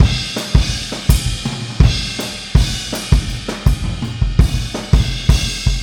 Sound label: Boogaloo Fill Kick, 82 BPM, Drums; Sonic Foundry (Sony Creative Software) - Drums from the Big Room The Mixes